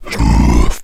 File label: growl; shamblie; npc